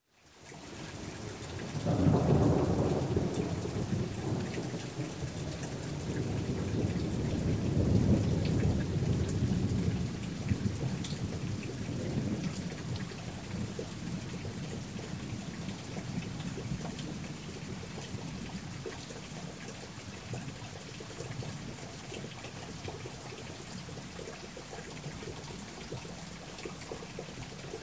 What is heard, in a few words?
Thunder Long Rumble, Rain & Water Drip; Sound Ideas 2000 Series